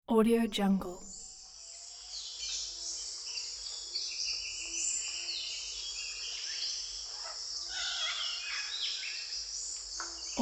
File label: jungle birds